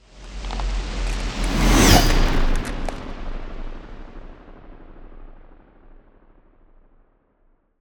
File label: Ice Spell Whoosh, Cold Whooshes; Arctic Aura; Snowflake